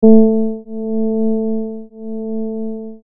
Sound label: fender